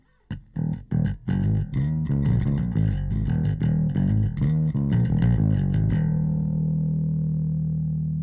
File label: bass